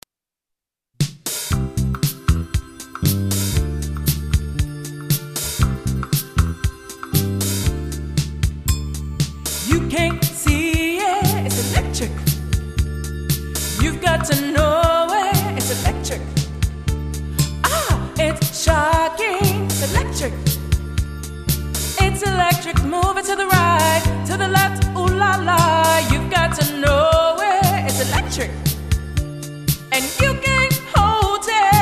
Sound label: Line Dance; Cover Band